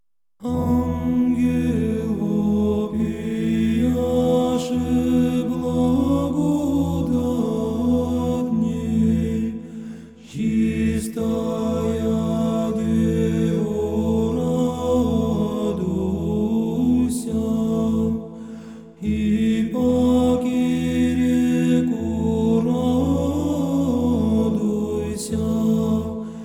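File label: hor, choir; bratii spaso preobrazhenskogo valaamskogo monastyrja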